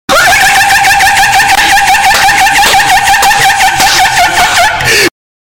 laughing; redzone

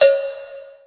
indian sounds

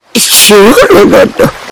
laugh low

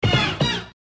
combo finish

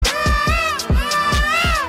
rap africa

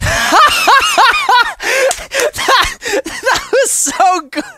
laughing; terroriser

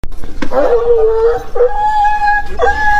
dog yelping

